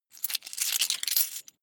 coins big clatter fast

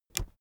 keyboard external space push

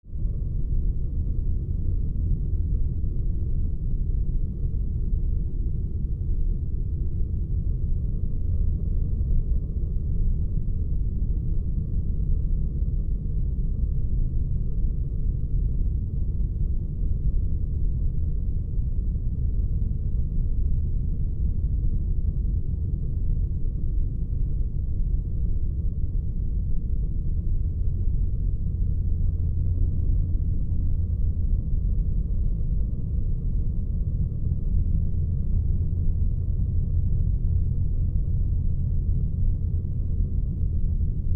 preheating

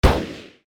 shot

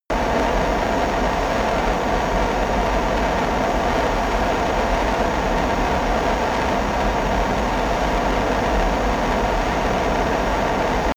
missile medium fly loop close

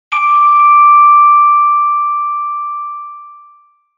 high bling

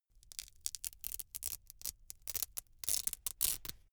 velcro open cap really slow

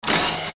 machine burst

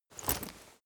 leather metal walk normal single

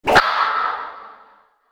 fight kung fu whip swish reverb

